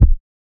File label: Sound Heart Beat